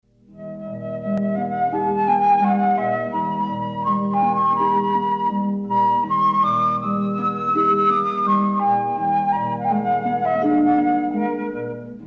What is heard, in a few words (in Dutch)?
Houtblazers dwarsfluit met harp